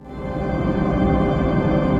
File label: Pad, Synth Pad; The End Pad; The Forge by Hecq; Ableton; Factory Packs